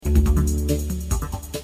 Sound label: ringtone